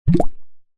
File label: bubble pop